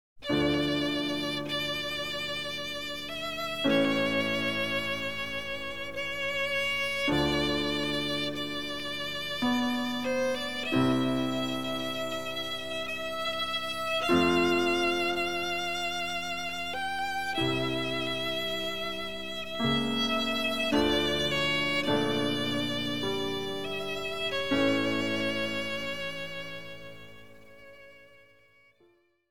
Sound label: Violin Sonata in D Minor, Op. 5, No. 12; "La folia"